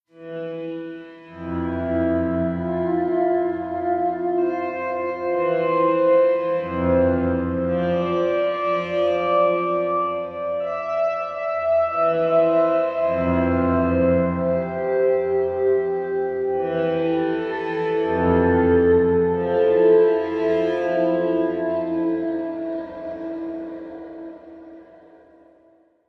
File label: AMB Guitar